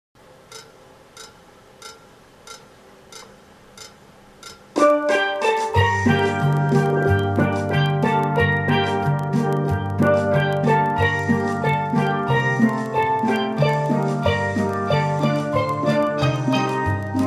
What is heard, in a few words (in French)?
5 PANS THEME ACCOMPAGNEMENT, Steel Band; BELLA CAO